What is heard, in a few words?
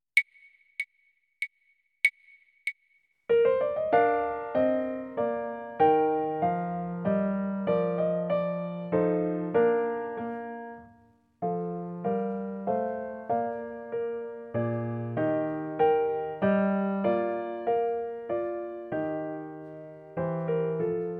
Allegretto accomp; Grade 10 Class Exam Solos; Xtras Play Mozart